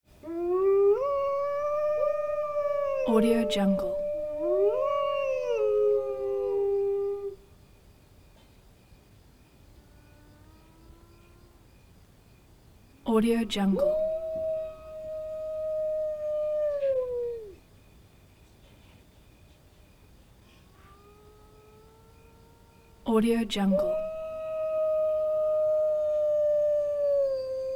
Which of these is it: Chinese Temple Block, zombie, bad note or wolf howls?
wolf howls